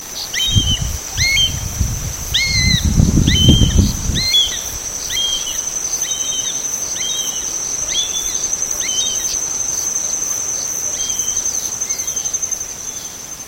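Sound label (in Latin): Circus buffoni, Uruguay; L del Diario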